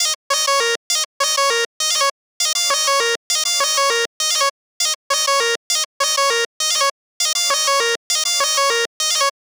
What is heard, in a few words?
Chop BPM 100 D Min; Gully Loops